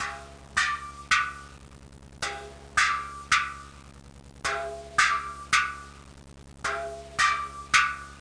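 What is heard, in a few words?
asian drum; WAV Sound Effects; music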